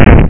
enemy Boom; Stella Obscura v1.0

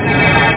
beam

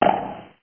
tennis; Pong Mania

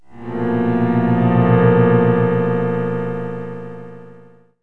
Suspense Accent; KABOOM! - Wild and Wacky Sound Software; Music; Drama & Mystery

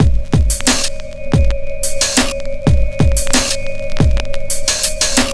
Beats 90

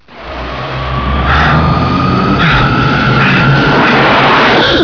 breathing; STRANGE DAYS

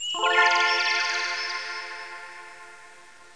Flower; CD Action

